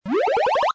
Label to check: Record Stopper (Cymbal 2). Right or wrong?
Wrong